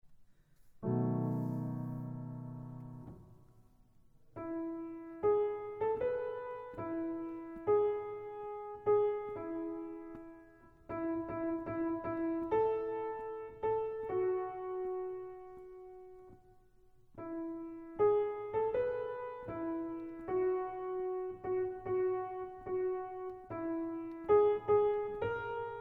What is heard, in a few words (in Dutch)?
Instrumentaal; Landerkennung